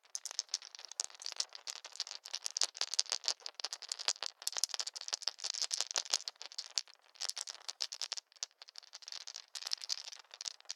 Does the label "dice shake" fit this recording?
Yes